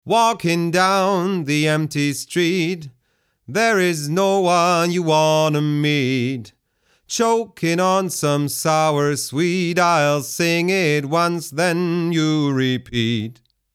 Tegeler Audio Vocal Leveler 500 Male Vocal Fast GR 3 dB